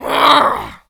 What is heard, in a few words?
attack, Zombies; zps; Z Waitress